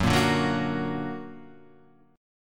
F sharp Augmented F sharp Guitar Half Step Down

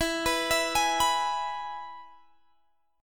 E-Major E; arpeggiated; x,19,21,21,21,19

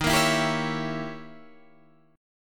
Eb 7th Flat 9th Eb, Guitar Open D

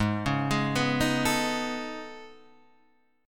A Major Sharp 11th, G sharp

